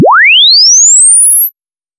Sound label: linear sweep; digital signal processing lecture